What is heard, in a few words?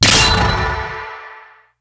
gold spear